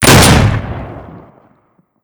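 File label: weapon shotgun double fire; doom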